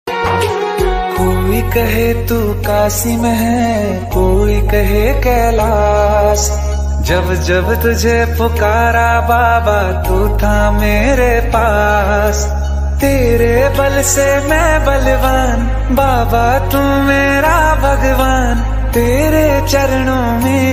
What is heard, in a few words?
bhakti ringtones